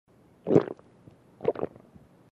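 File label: sounds of swallowing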